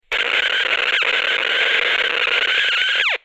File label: Cracklebox Nasty Noise; Tombola